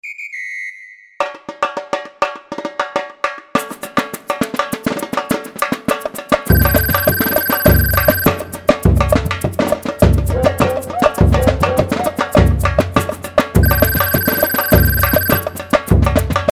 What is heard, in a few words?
Batucada